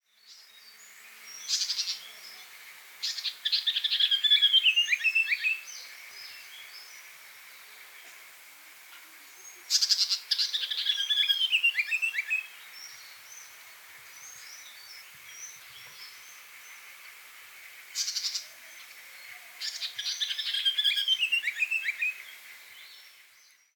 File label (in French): Tchitrec malgache Terpsiphone mutata; espece